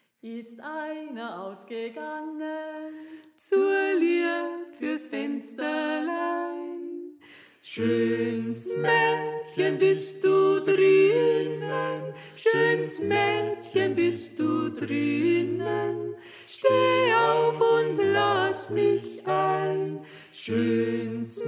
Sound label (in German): Sopran; 60-Ist Einer Ausgegangen